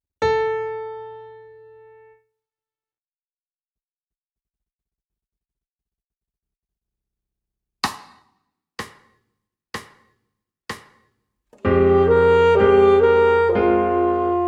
multi sax; multicolores

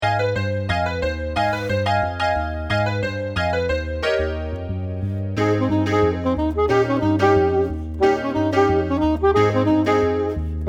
sax

